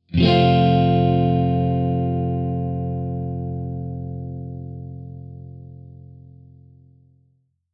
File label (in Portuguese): Acorde de Triade de F